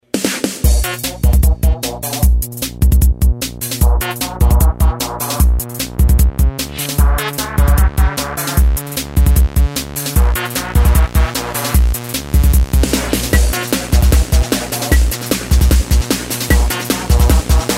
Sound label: melodie